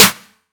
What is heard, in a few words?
Snare, Psytrance; One Shots; Claps Snares